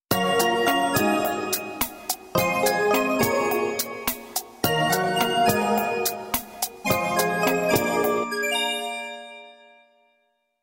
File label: melody